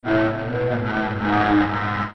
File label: BongoBongo Murmur; OOT